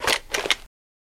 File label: Holster Draw Gun Mov; holster mvmt